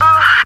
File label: "uh" orgasm; ooh ahh